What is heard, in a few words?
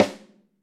Ludwig Snare